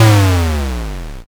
explosion m

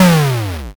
explosion m

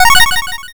powerup

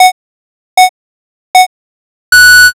countdown

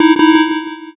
wrong

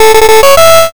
fanfare

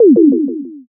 poyon

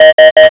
alarm

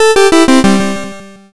gameover

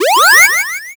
charge

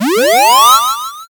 charge

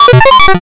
glitch

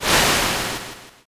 swoosh

